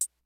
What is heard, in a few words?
CLASSIC, DRUMS; Kid Nepro; Elite